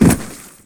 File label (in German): auf Boden fallen; mount blade